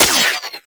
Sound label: Shot, Weapons; Warframe; Tenno; Dual Soma; Prime Dual Soma